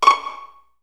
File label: PZ C#3, strings pizz; ILIO; Kirk Hunter; Virtuoso Strings